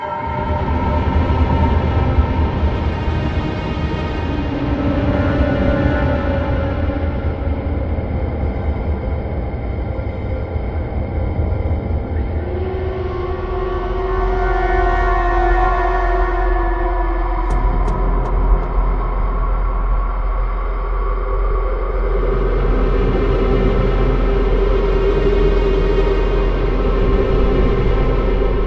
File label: Tension ambient; Judge Dredd: Dredd vs. Death